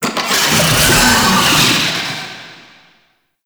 Summon Vocal Verb; Warframe; Uriel; Catenach

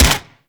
Infested Swarm Rifle 2D Shot; Shock Swarm Rifle; Weapons

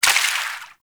Water Sprayer Surface Impact; Warframe; Weapons

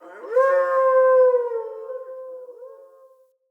Wolf Cub Trapped Call Distant; Vocals; Animals

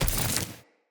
Walk Vegetation, Footsteps; Enemy; Characters; Lady Deora

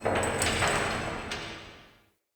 Under City Distant Metal Clang Ring; Ambience

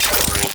Charge Up; Warframe; Corpus; Spear Gun; BE Ferrox

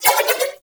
Nunchaku Prime Spin Whoosh; Weapons; Warframe; Tenno

